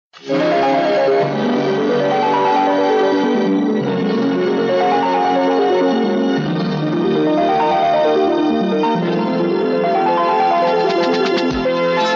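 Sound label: Instrumental, Flute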